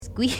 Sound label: squee casual